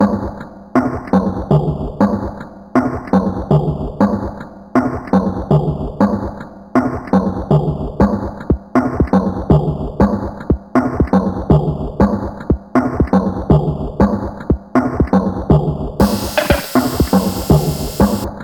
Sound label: scissor toothbrush flute, make a song using samples